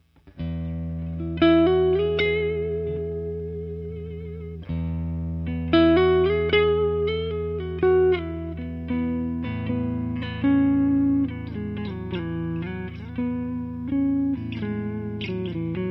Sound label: Clean, guitars